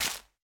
大型垂滴叶破坏声

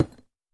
深板岩 放置声

